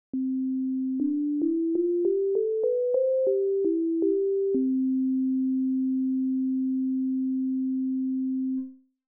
do re mi

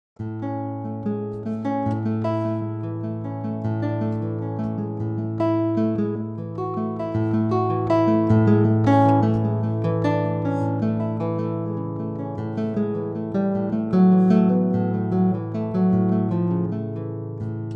Tokkel, guitar; Pianoteq 8

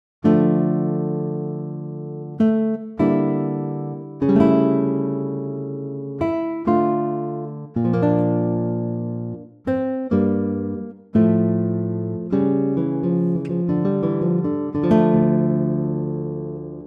guitar chaconne; Pianoteq 8

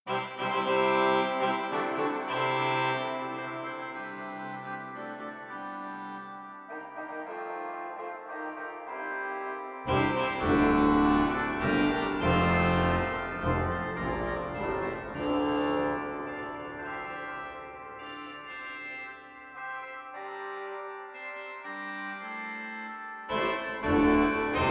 Grand jeu; organ; Organteq

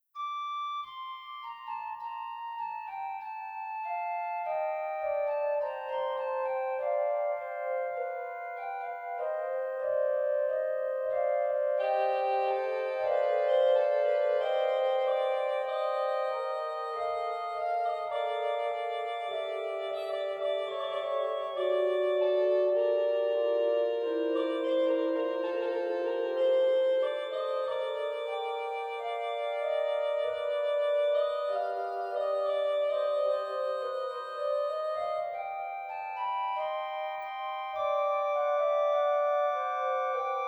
Vox humana flote, organ; Organteq

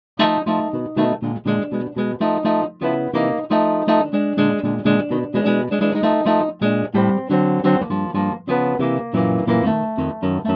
F blues, guitar; Pianoteq 8

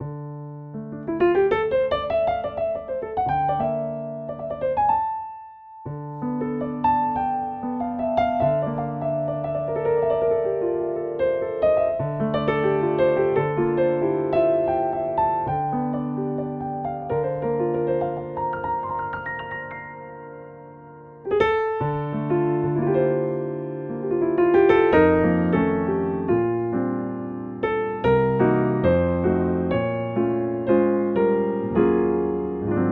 Ballad; Model D; Pianoteq 8

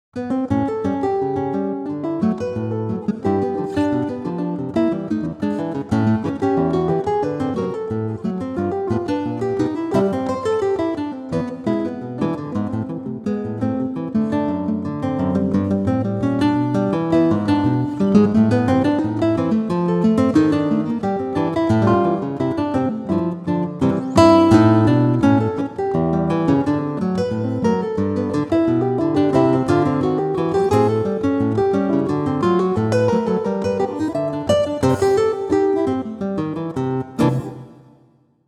ragtime, guitar; Pianoteq 8

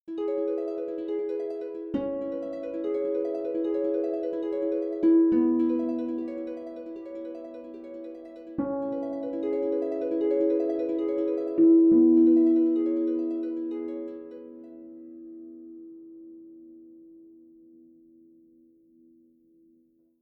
pinch harmonics, harp; Pianoteq 8

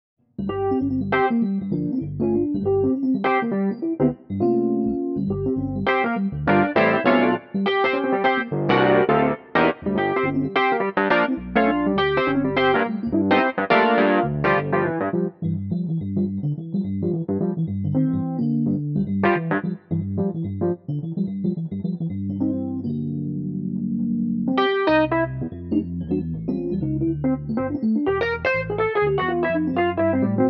rhodes sweet flanger; Pianoteq 8

